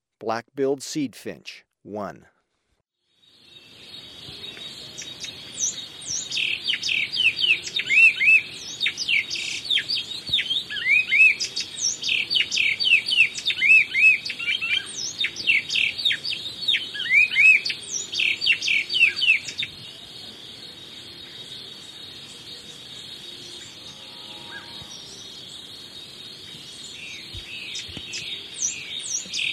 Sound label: Black-Billed Seed-Finch, Birds of Ecuador; S. America